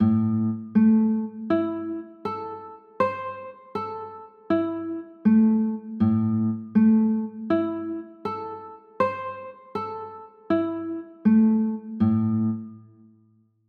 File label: a m, chords